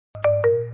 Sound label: dingdong